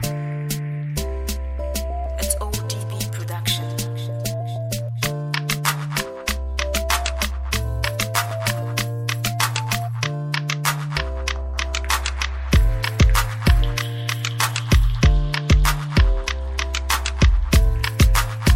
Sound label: Instrumental Riddim